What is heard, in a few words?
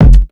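94 Drum Sound, Pure Electro; USB Soundscan vol.03; AKAI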